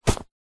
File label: foley clothing shorts thick cotton grab